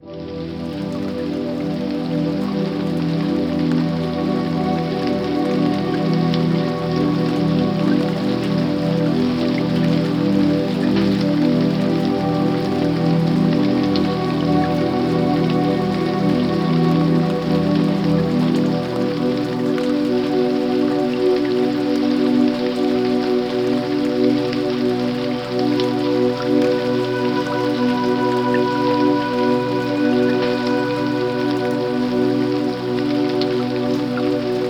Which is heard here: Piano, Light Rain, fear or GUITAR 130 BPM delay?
Light Rain